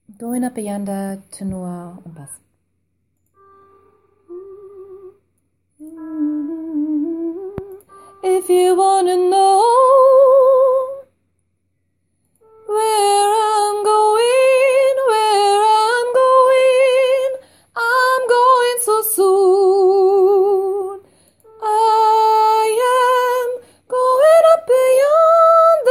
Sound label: tenor bass, gospelchor